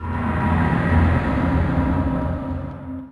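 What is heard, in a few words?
gust interior, ambient atmosphere; Portal 2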